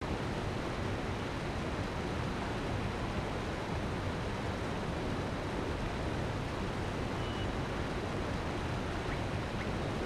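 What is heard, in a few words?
Water Falls ambience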